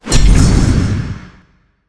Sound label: dg axe skill, weapons